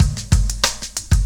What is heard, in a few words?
Breakbeat, Drums & Percussion